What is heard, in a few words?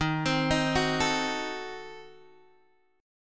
D sharp Diminished 7th D sharp Guitar Open D